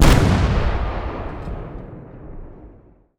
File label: ships destroyer attack; Hearts of Iron IV Death or Dishonor